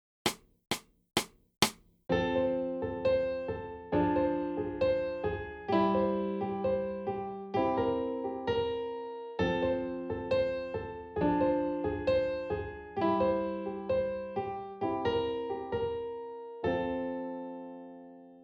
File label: syncopated warm up